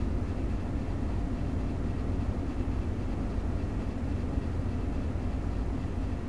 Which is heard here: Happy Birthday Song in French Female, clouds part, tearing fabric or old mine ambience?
old mine ambience